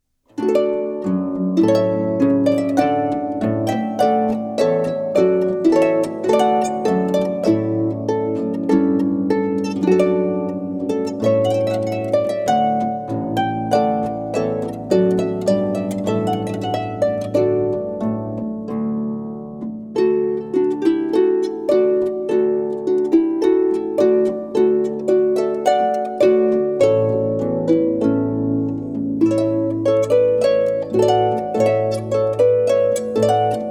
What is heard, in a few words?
harp; harp-samples